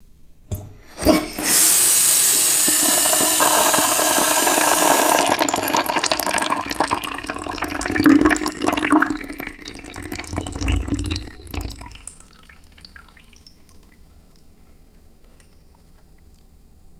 water draining from a wash basin

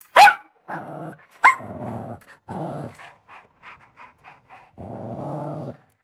small dog yelping barking and panting; animals

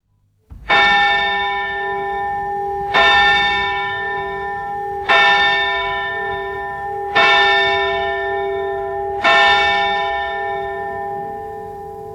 large outdoor clock bell striking five times

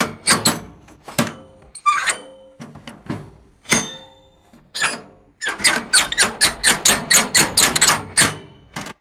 metallic handle knob turning and ringing; mechanical

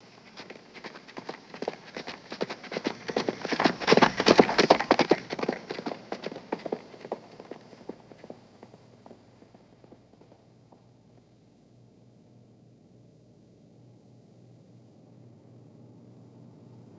rapid footsteps running and departing; foley

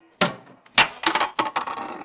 hard plastic gym equipment dropping and rattling; tools

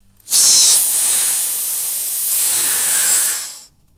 short compressed gas purge and hiss; aircraft

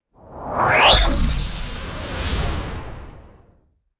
sci fi energy weapon pass by; lasers

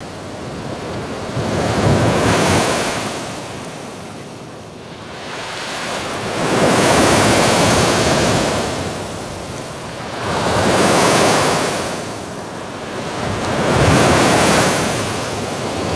ocean waves crashing on a beach; water

